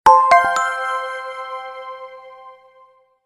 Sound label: Alert RainDrop; HTC T-Mobile Shadow